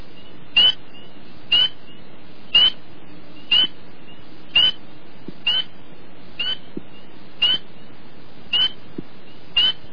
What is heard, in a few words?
spring peeper